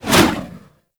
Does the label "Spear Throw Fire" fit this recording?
Yes